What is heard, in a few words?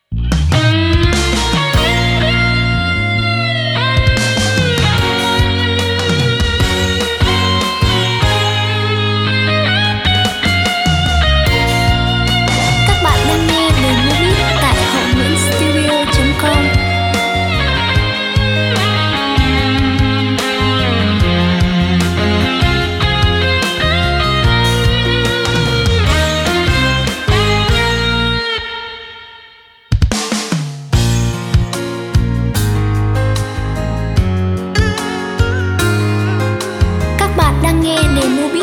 beat, playback